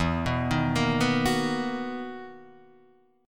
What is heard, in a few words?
B Major 7th E; BM7/E Chord {0 2 1 3 0 2} arpeggiated